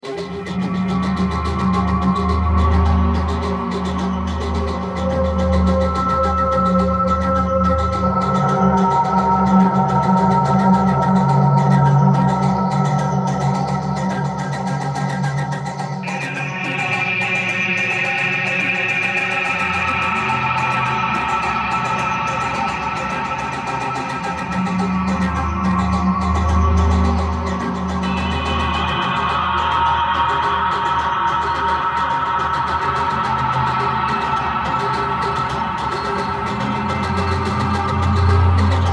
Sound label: Atmosfear (60 BPM)